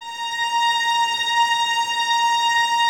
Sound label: Violins A#5, String Ensembles; Miroslav Vitous; 11 Violins